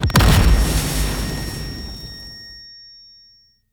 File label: flashbang